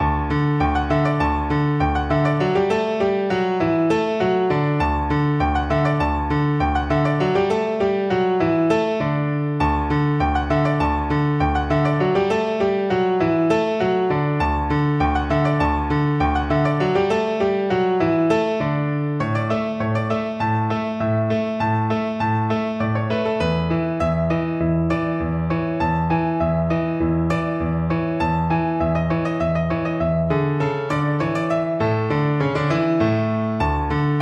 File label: musette, piano; bach